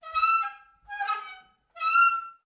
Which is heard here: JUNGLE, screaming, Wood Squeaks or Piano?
Wood Squeaks